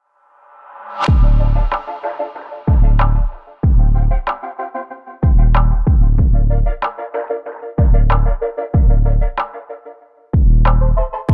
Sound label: Laidback Intensity